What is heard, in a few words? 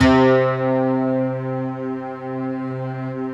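bright guitar strings, Slow Bright Guitar Strings; Optical Media International - Sonic Images Library; Eastwind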